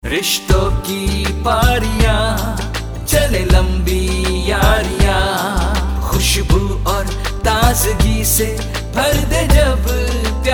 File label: Jingle